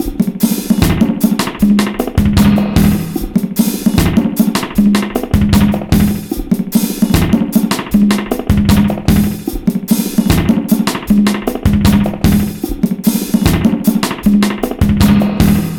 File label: 76 bpm, break; ONE DROP; 90 s Sample CDs; Planet of the Breaks